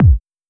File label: soft slider tick